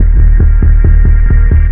INTRO BASS; Big Beat Samples & More

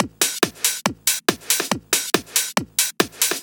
Addon Loop, Drum Loops 140bpm, Perc Loops

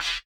BUZZER; Spectrasonics; Metamorphosis

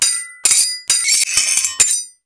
SWHIT, SWORDHIT; Total Control - Action Cutz

